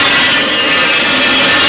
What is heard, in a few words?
metal scrape loop; Soldier Of Fortune II Double Helix; XBOX